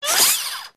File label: gunstand servo; gow; turret judgement